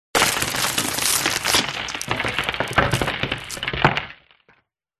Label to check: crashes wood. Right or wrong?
Right